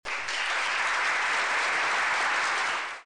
aplaudan

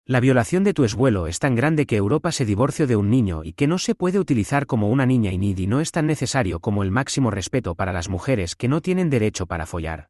la viola

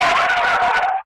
frenada rapida

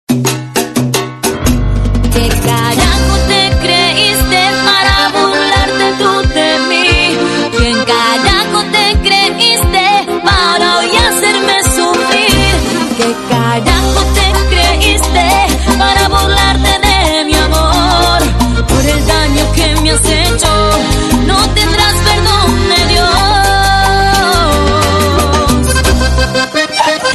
cumbia que carajo

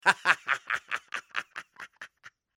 risa breve

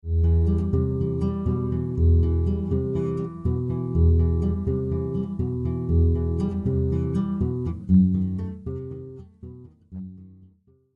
guitarreo

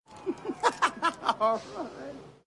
risa; kurt russell

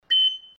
flauta

